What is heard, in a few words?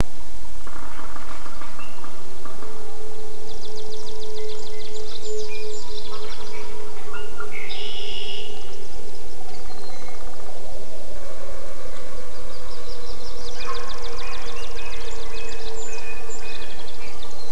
Killarney Birds Wolves